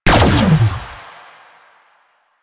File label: Fire Shot, Weapons; Duviri; Incarnon Adapters; Incarnon Cestra